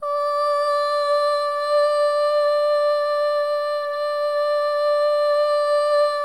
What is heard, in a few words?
SOLO BOY, OH; Spectrasonics; Symphony of Voices